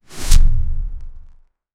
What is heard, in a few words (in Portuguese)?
som de esquiva samurai re, japan